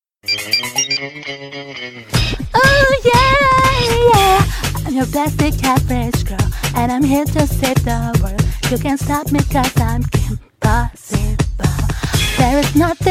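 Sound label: Theme Song, Singers, TV Theme Song, female; Kim Possible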